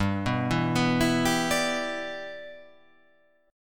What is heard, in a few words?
G 6th